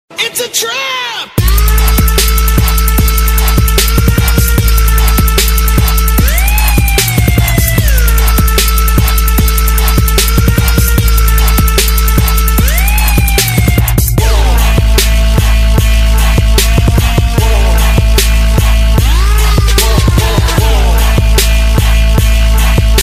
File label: oppo a37 original ringtone